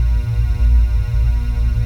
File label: Scifi Dome Shield Loop; SFX Kit; Sci-Fi Loops